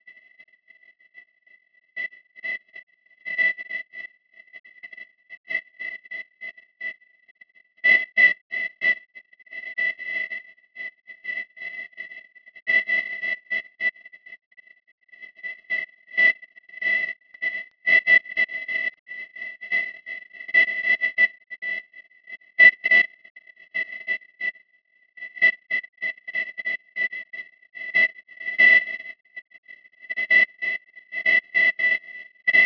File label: Jazz